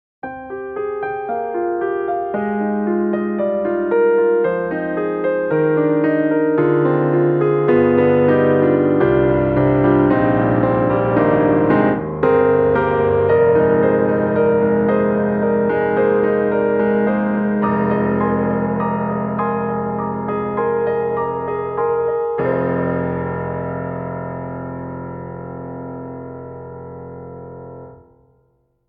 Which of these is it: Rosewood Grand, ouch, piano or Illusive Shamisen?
Rosewood Grand